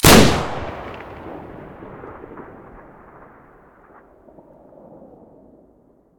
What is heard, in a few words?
bm16 short hole stereo, weapons